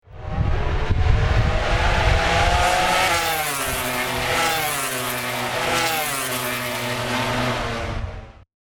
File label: Racing SE